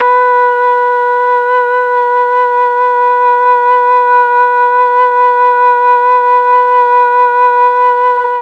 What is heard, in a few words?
B2 mellotron FLUTE